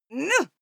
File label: female fight attack shout short; schreie; menschen